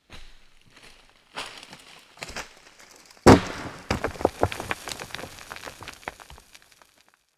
ambient; World of Tanks

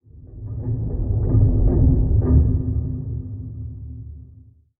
submarines engines; World Of Warships

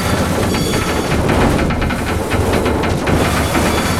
plane scrape loop

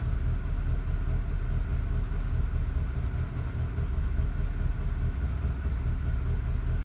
engines; World of Tanks

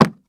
horse footstep wood hard; Rust

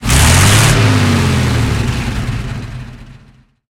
panther engine load, engines tanks; War Thunder

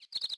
goldfinch; World of Tanks Blitz; hangar blitz

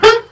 Mi-8MTV2 Mi8 brakes on, aircraft; DCS

